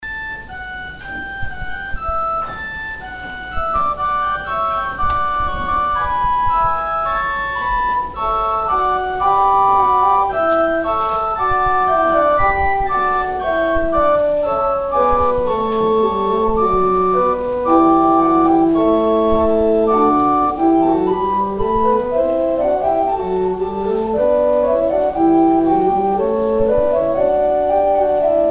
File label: organ interlude